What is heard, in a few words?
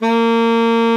A#3, alto sax; Xsample VOL-2